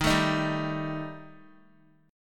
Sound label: D sharp Major Flat 5th down Guitar; D#Mb5 strummed; 4F,4A,5C,5G,5C,6E; JGuitar